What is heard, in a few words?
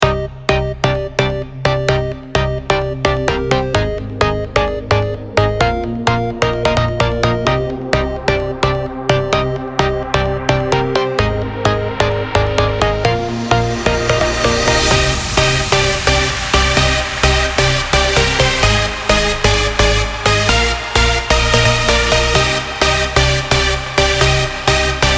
Drop Bass